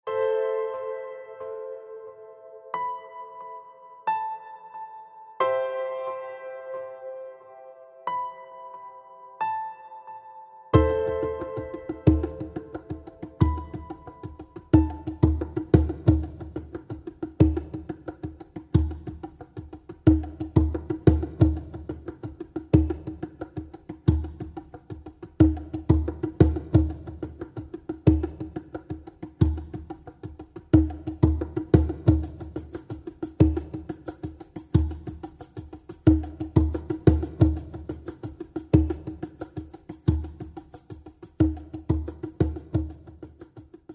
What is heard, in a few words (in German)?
Schamanische Trancemusik